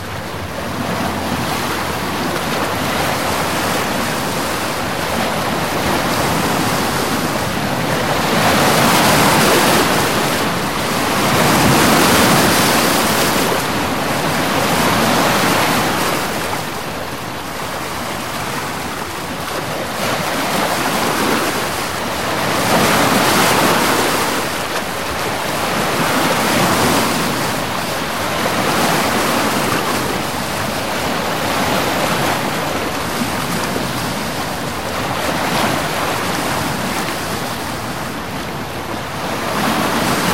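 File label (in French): Mer calme quelques vagues paresseuses plus grosses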